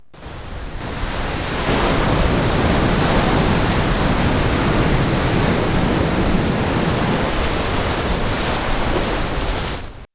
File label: ocean; plaza2